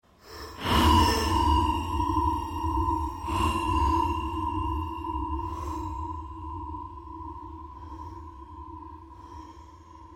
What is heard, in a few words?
pulse fade long, Various sweeps drones and pulses; Rise And Dive Sound Effects